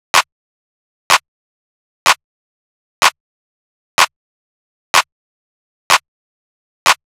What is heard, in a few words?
Drum Loop - 125 BPM Clap; Loop Stems; Cymatics; Fever Dream Drum Loop